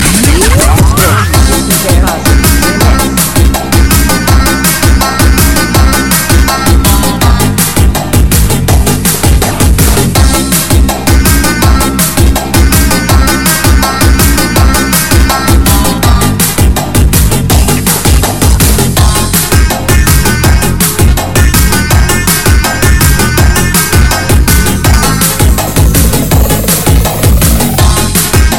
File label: Big Beat